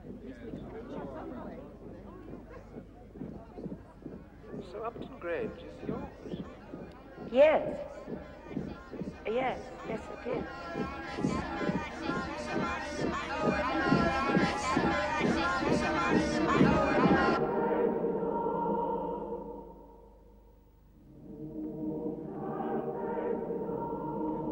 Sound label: Marching, chanting, gossip